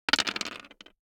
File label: DICE on Hard Wood, Throw and Roll, Standard, Three Dice; Samples; ArticulatedSounds; DICE Sfx Party (Extended)